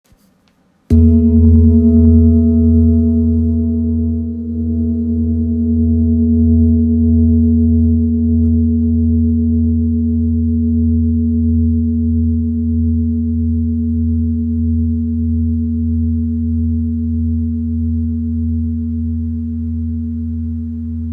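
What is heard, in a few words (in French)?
Bol ramgandi mi, bol chantant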